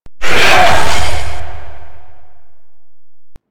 Golem Grenade Head Attack; Warframe

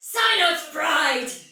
Pre Death Soldier Female, Battle; Dialog; Sinecure

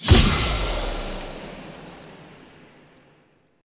Blood Lust Impact; Powers; Fey; Founders Champion

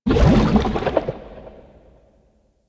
Landscape Coolant Gurgle; ambience; props; one offs; Warframe; Venus Open World

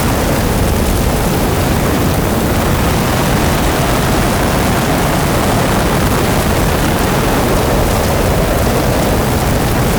Alchemy Vent Active Loop; Warframe; Entrati

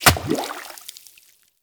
Bow Arrow Impact Water; Weapon Impacts; Player; Bows; Hunter Bow; Environment; Envoy Hunter Bow

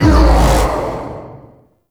Spear Gun Throw Proj Buff Hit; Weapons; Warframe; Tenno; Hoplite

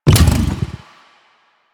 Rev From Stop, Motorcycle; Motorcycle Ninety Nine

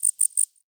wetland forest day insect close; Ambience

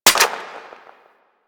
Fire Mech Single, Weapons; Warframe; Gorgon

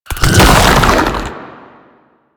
Octopede Horrors Charge; Warframe; Man In The Wall

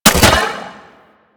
Space Drone Bomb Fire, weapons; Grn Space Drone

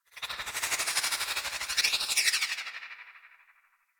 Freeze Ray Laser; Warframe; Weapons; Corpus

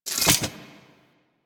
Impact Retract; War Pact; Warframe; Warframes; Priest

